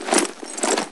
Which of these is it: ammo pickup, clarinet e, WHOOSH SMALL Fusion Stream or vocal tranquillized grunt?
ammo pickup